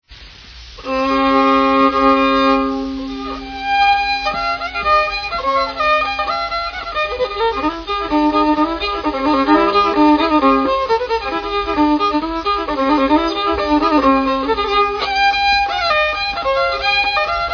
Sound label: fiddle